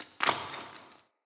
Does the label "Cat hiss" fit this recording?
No